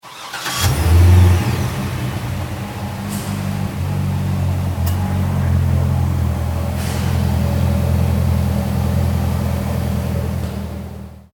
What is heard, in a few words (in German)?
Autowerkstatt autostart